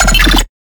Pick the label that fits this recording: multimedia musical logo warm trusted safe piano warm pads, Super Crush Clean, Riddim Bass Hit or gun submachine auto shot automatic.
Riddim Bass Hit